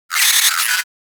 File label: Space Drone Death; Enemies; Warframe; Corpus Space Drone